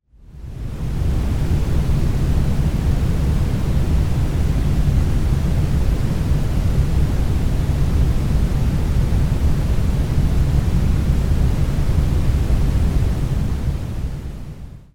peach noise

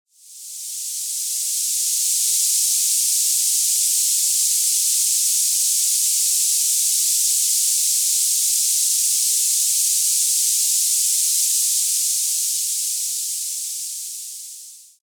Tinnitus Flosser 8 kHz Noise Masking

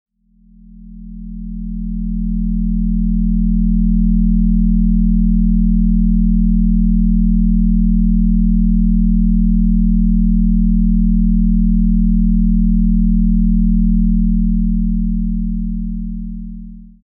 Binaural Beats 40Hz with 40Hz Bass Tone; binaural tones

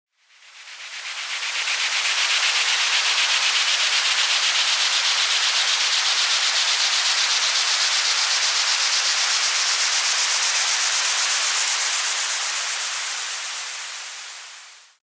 Tinnitus Scrubber Noise Masking

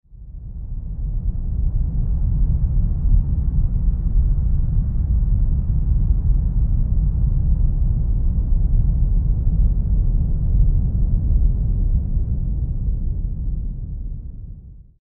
Sweeping Brown Noise; dynamic frequency; sleep; tinnitus

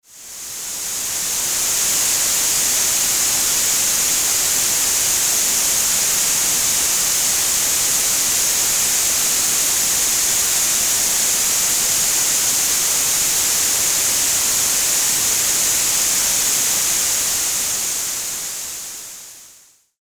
tinnitus nexus 6800hz linear static; focused static noise

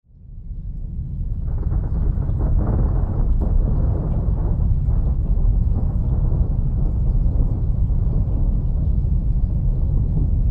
Thunder and Brown Noise; nature sounds; ambient listening

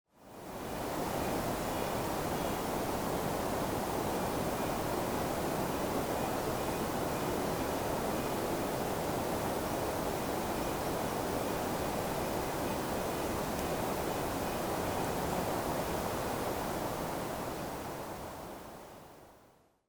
wind in the forest trees; nature sounds ambient listening bundle

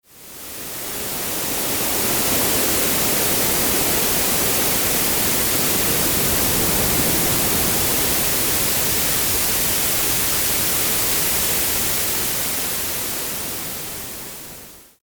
Teal Noise; teal noise ambient

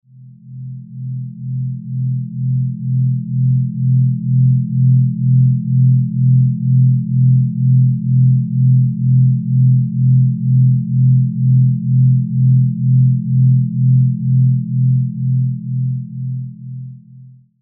binaural beats 2hz major 3rd; delta; 41hz gamma wave range

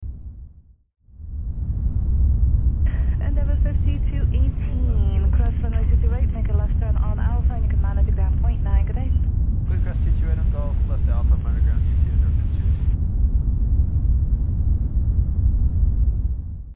Orange Noise and ATC; sleep sound

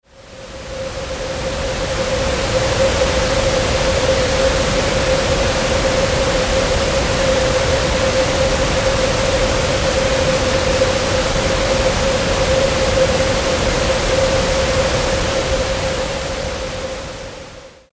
pink noise 528hz boost

